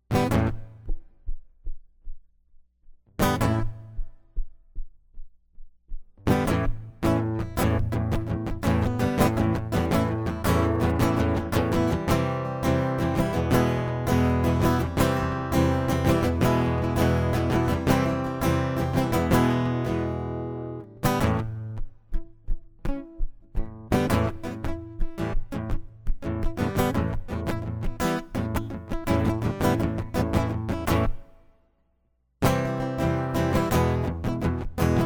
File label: acoustic; MegaEpix